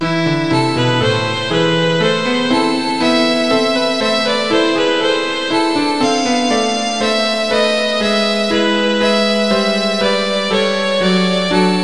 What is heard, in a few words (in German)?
menuett a moll; bach